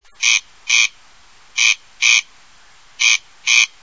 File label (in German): wachtelkoenig